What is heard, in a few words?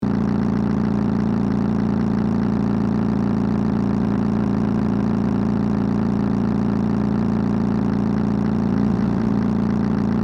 Motorbike driving engine high rpm loopable; vehicle